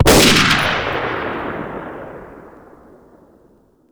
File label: k98 scoped shoot; weapons